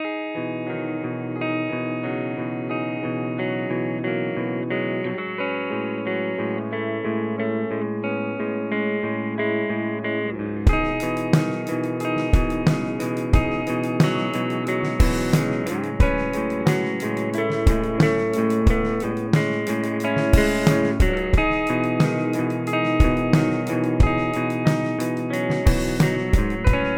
Cort MBM-1 Clean

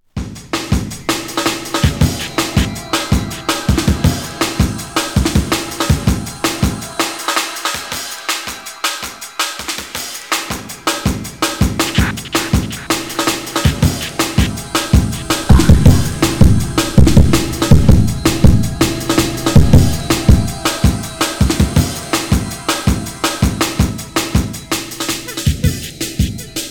EQ Sweep; DJ-Tech X10

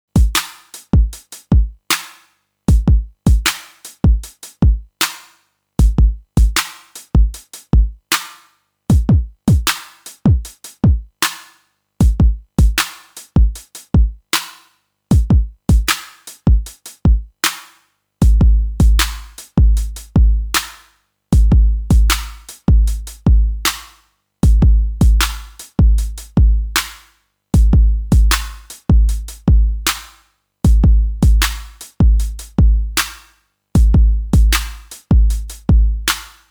TR8 MX1 Plain RnB Vibe

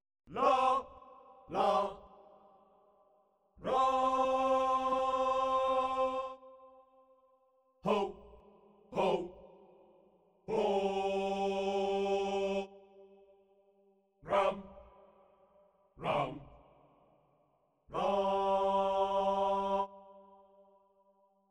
Tavern Singers

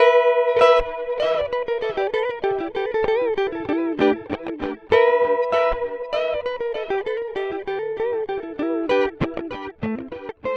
Starlight Echo Station Tape B

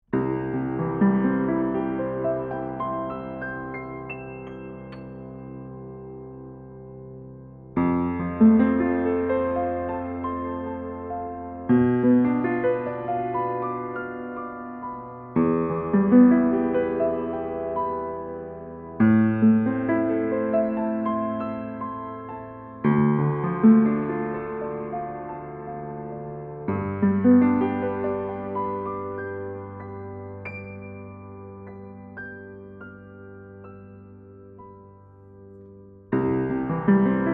OC 818 Piano Wall Omni

